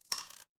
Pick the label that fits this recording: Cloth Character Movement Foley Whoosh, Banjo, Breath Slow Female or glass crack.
glass crack